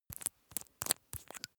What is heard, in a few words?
makeup box screw cap open; haus-garten; badezimmer